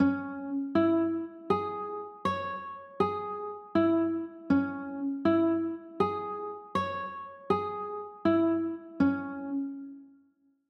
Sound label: c sharp m, chords